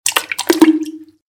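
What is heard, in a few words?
sounds of diarrhea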